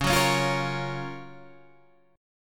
Gb Major 7th Db, guitar

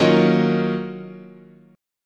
D sharp Minor 9th, chord

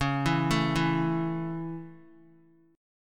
C sharp Minor Sharp 5th down Guitar; C#m#5; arpeggiated; JGuitar

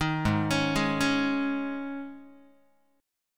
G sharp Suspended 4th Guitar chord, down; G#sus4 arpeggiated; 6,4,4,6,5,x; JGuitar

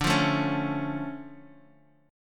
D Minor Major 7th D Guitar; 9,8,9,6,x,x; DmM7 strummed

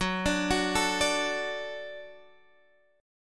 Gb Minor Sharp 5th; Gbm#5 arpeggiated; x,9,12,11,10,10

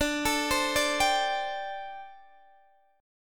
D 7th Suspended 4th, D, down, Guitar Open D

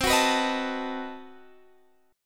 B 7th C; B7/C; strummed

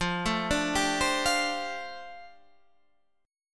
F 6th Add 9th F down, chord; F6add9 arpeggiated

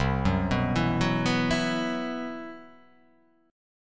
C Major 7th Flat 5th C

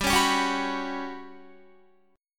G Major 7th Flat 5th, Guitar; GM7b5 strummed

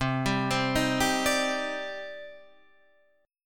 C Minor Add 9th C; arpeggiated; madd9:1,b3,(5),9; JGuitar

